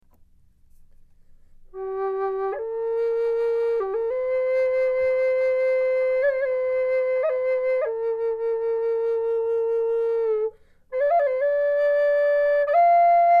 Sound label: Mid G Ash, flutes